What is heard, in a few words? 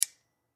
Lamp Turn On, Lamp Turn On Switch; Lamps; Household; Blastwave